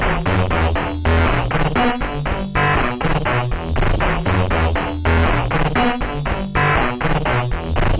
grunch vs ginch melody, synth and electronic loops